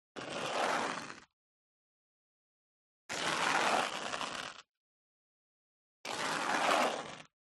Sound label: FOLEY STEP High Heels Concrete Grit Slide Long; smartsound